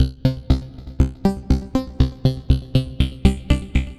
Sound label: Scandle Synth, Synth Textures; Sonic Foundry (Sony Creative Software); The Electronic Music Manuscript Collection